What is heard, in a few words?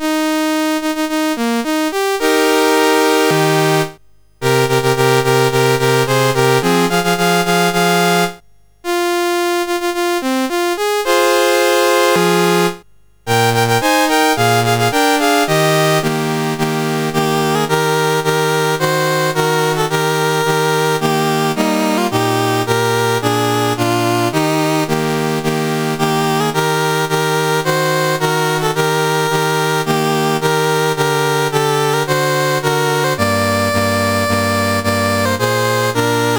Colombia National Anthems, CSG8580R5